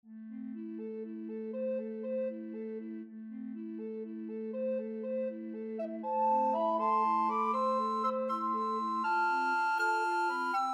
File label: recorder quartet